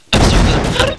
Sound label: pain; Alien Arena; droideka